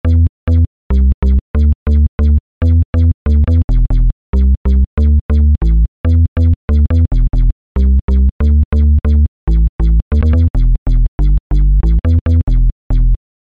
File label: SYNTH TEXTURES - Bass; Negril Bass; Apple GarageBand 2